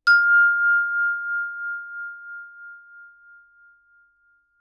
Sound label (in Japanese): ヴィブラフォン; 体鳴楽器; 打奏